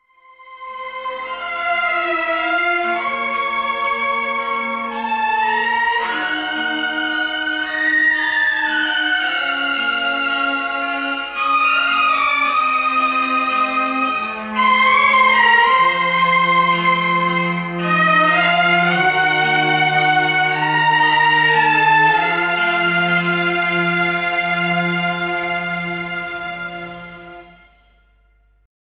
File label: Theme (Instrumental); Prem Granth